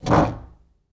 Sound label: Foosball Goalie Spin